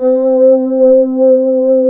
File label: K3 mellotron, Kawai; Rubber Chicken Software Sample Bank